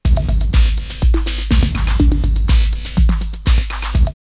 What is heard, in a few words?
Doom Loop 123, drumloops, Rave; X-Static Goldmine; 90 s Sample CDs